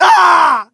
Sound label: pain